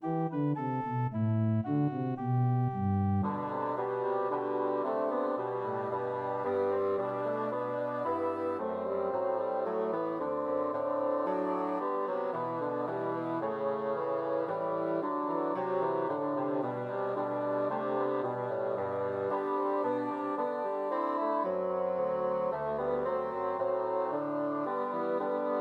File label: Away in a Manger Christmas Trios for Three Bassoons